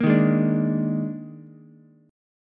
D sharp Major 7th Suspended 4th, D sharp, down, Ukulele; strummed; D#M7sus4; JGuitar